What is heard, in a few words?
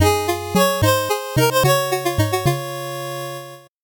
8bit jingle fast